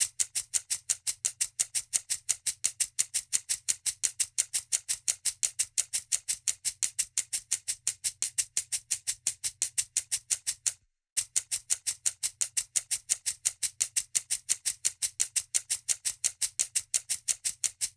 Drum Loop Kinetic 172bpm

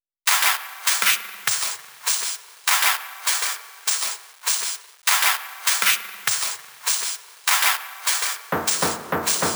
Top Drumloop 100BPM

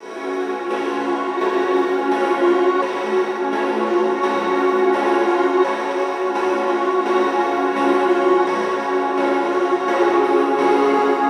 85 Bm INSTRUMENT LOOP

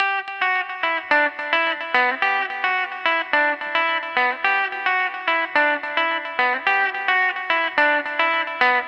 Guitar Clean E 108

